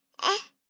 Baby Short Coo, Baby sound, 1 yr., short coo, human voice, kid, child, infant; Talking Sound Effects; Sound Effects:Voice; VoiceBox